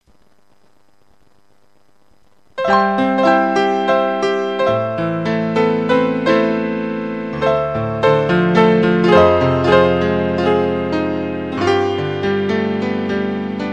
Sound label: Christmas Piano Silent Night